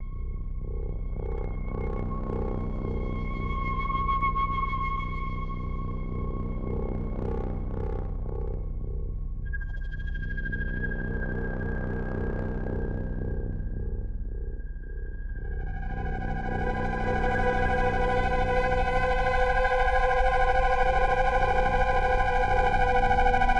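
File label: digital spook ambient; transformers war for cybertron gamerip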